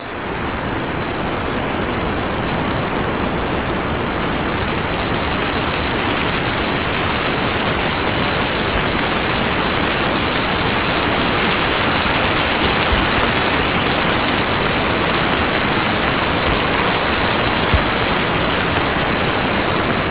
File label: rain; Sensorium; kawasaki